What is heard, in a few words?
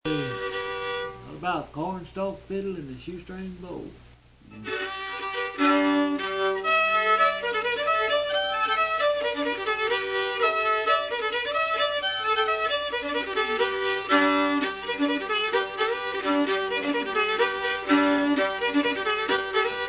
cornstalk fiddle shoestring bow